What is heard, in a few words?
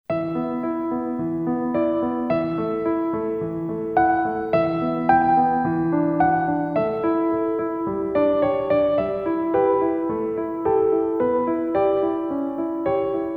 Winter Journey for piano